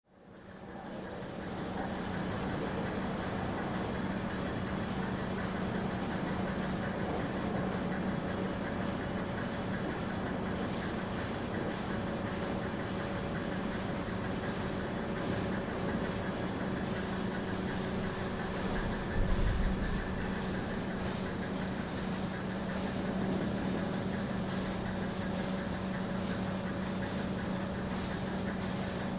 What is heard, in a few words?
Industrial Amb, factory